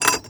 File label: Moody Park Chimes Perc; Houston